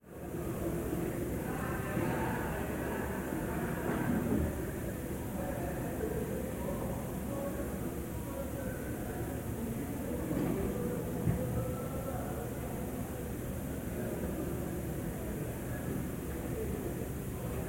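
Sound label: sounds of room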